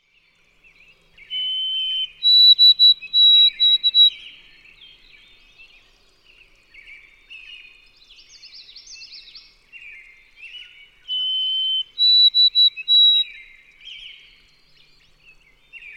White-throated Sparrow, birdsong

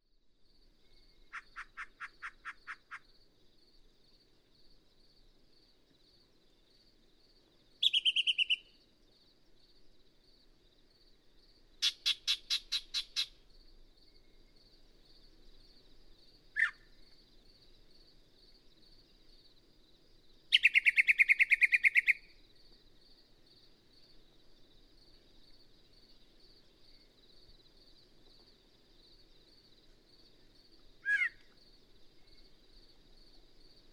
Yellow-breasted Chat

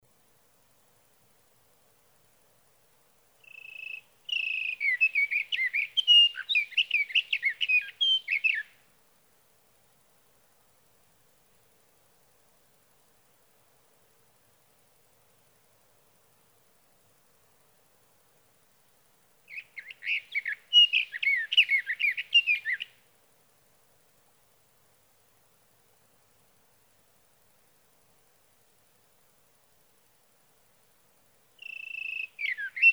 Sage Thrasher; Sage thrasher songs, through the night.

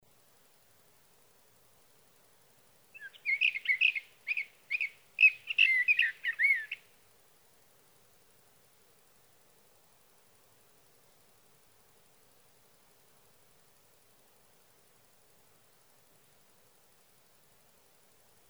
Sage Thrasher; Sage thrasher songs, through the night.

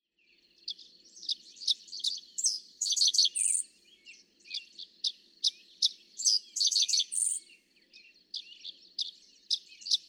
Dickcissel, longer recordings; longer recordings from those three individuals

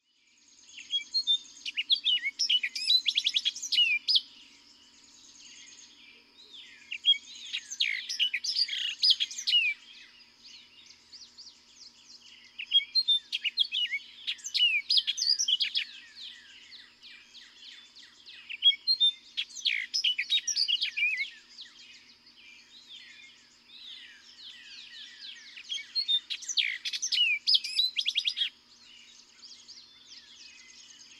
Orchard Oriole

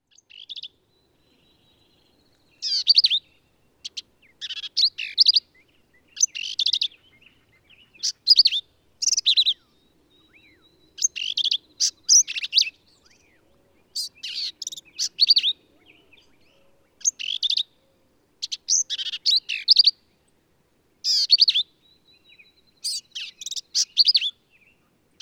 Black-capped Vireo

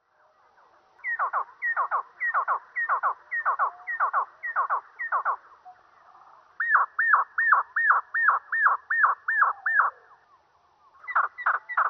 Sedge Wren

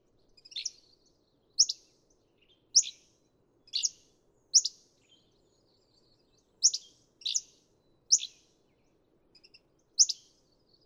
Hammond's Flycatcher, birdsong; Empidonax flycatchers

